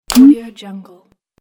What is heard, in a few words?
button